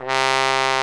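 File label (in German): POSAUNE VIBRATO; Best Service; Alpine Volksmusik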